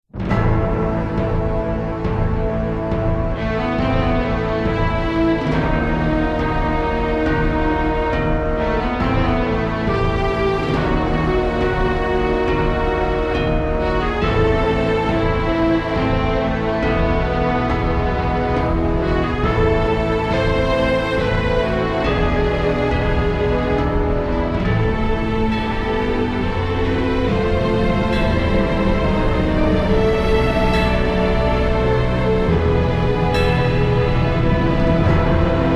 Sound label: scene; シーン別音楽C; 悪のテーマ・重々しい